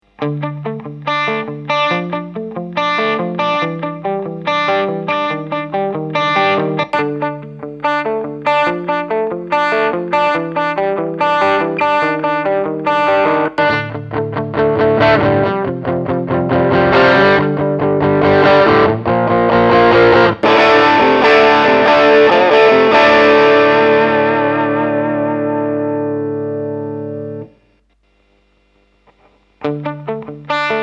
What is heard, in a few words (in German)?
Nux Solid Studio IR Pow Amp Sim Boxenumschaltung